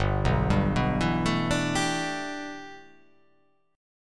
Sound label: G 6th Add 9th G, chord